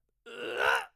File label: male death